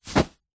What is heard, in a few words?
clothes sports shorts drop carpet; zapsplat